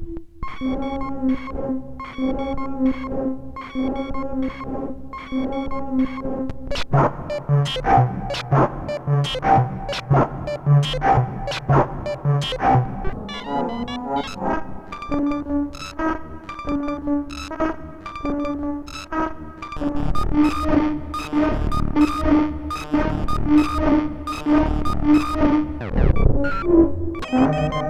SOMA ROAT Orch Hit Groove Chaos plus MI Clouds

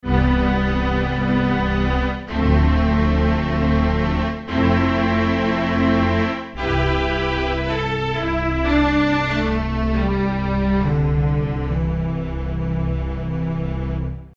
marcato

